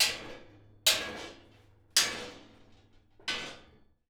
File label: Hitting, Workshops